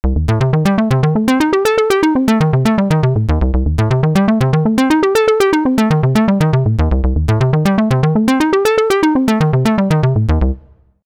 Synth Vel via env cutoff, random velocity values to modify the intensity of an envelope modulating the cut-off frequency of a lowpass filter; [B] uses random velocity values to modify the intensity of an envelope modulating the cut-off frequency of a lowpass filter.; MIDI velocity